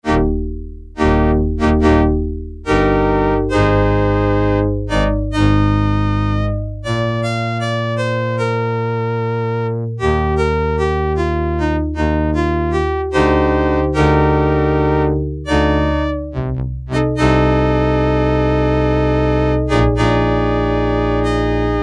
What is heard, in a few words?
STRINGS 3, Yamaha DX7